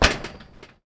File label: metal grate, player footsteps